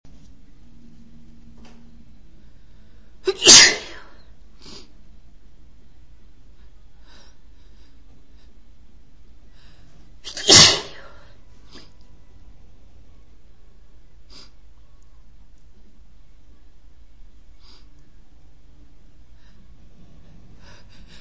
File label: hard to breathe, female